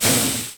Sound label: gas hurt; garrysmod